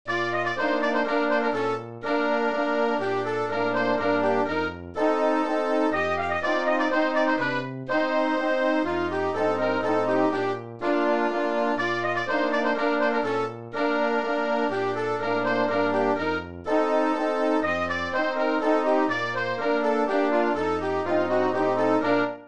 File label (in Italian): fanfara mazurka